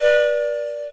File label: au pipes; TamTamMicro.activity